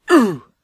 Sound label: npc hit; pmobs